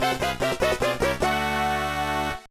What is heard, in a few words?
Jingle; Doraemon Dokodemo Walker; Game Boy Advance